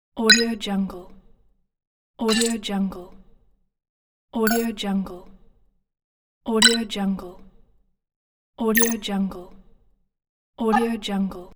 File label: scifi interface buttons